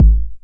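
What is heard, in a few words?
909 BD tape, kick drum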